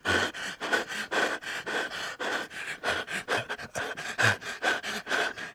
male out of breath; Project Zomboid v41.73

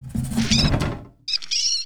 Animal Trapped wood; Project Zomboid v41.73

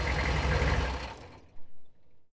vehicle running out of gas; Project Zomboid v41.73

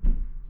footstep upstairs; Project Zomboid v41.73

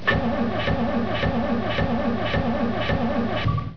vehicle failing to start; Project Zomboid v41.73

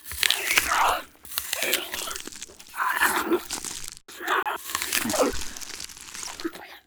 Female Zombie Eating; Project Zomboid v41.73

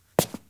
zombie footstep; Project Zomboid v41.73

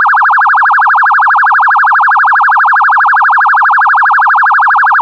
vehicle siren yelp; Project Zomboid v41.73

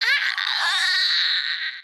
Female zombie Death; Project Zomboid v41.73

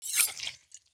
head extract; Project Zomboid v41.73

